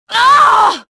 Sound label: death